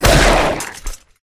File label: Rat wound